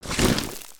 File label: Large Pet Digs; creatures pets